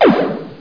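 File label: PHASE SHOT; HotLeather2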